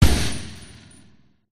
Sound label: machinegun stop stereo; silverback